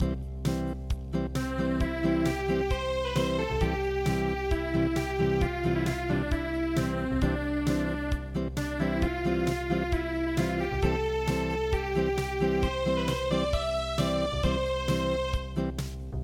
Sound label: Acoustic